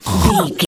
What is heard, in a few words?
voice fun character vocal crazy hurt